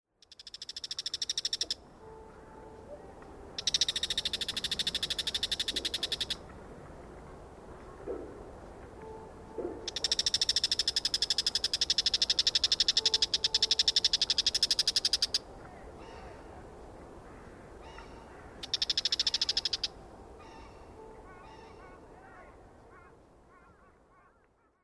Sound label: kiji over the mozu